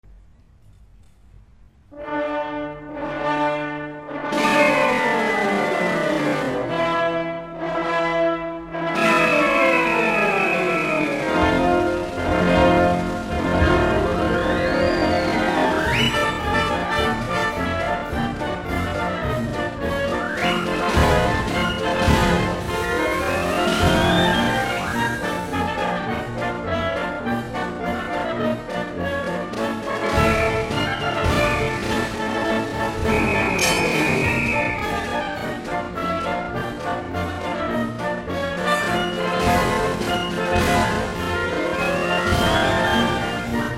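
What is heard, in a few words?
Circus March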